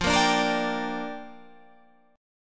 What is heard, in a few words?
A sharp 6th F